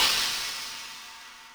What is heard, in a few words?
CYMBAL, Yamaha RM 50